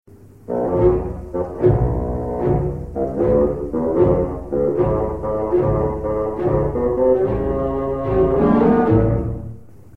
grand pere basson

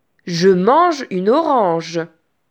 vocal

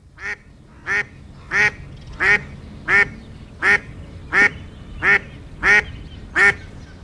canard

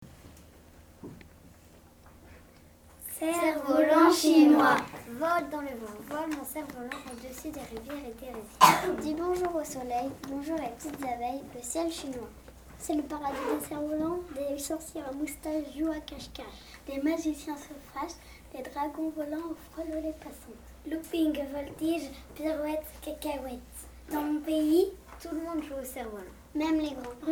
cerf-volant chinois

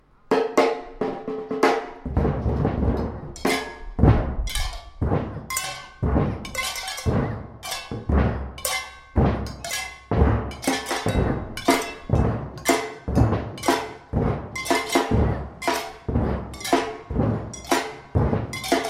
percussions tournerie perturbée

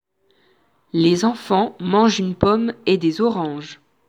vocal

